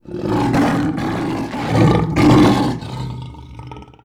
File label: Bengal Tiger Roars